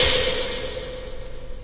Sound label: space crash, DRUMS (SINGLE HITS), Crashes